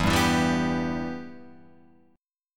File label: E-Minor, Guitar, Open F; strummed; JGuitar